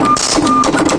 121 industrial-whistlemelody, melodic loops

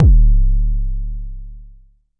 Jomox MBase, drum machine; Jomox MBase 01

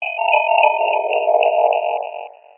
ambience; Scars FNC SFX

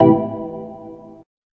M1 organ low; Organs

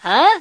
huh question; FEMALE MISC and SPOKEN WORD

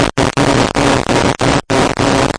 escaping, video games; panic

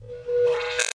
reverse shock

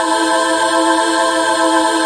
choir a chord loop; Vocal

